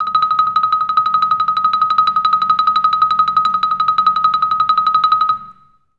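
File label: TREMOLO D#5, Orchestra; Best Service; Peter Siedlaczek's Advanced Orchestra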